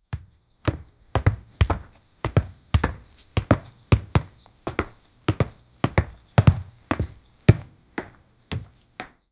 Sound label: boy slow run from left, floor